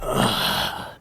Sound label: groan